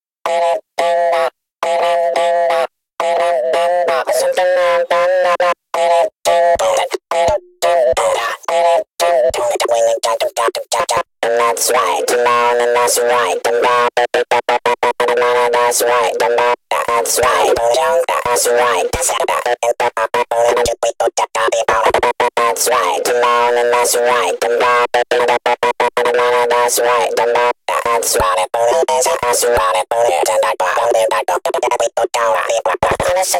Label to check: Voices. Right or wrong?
Right